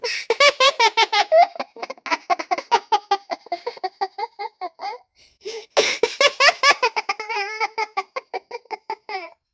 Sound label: child laugh